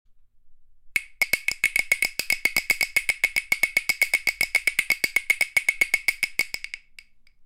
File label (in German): Schüttel trommel